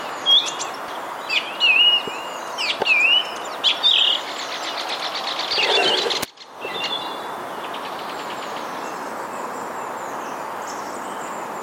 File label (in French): grive musicienne draine friche ville